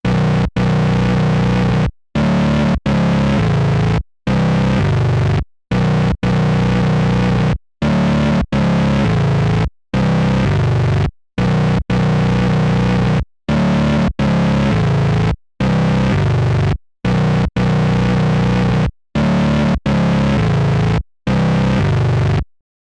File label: Behringer K2 Ext In Bass Loop Max Distortion HP Resonanz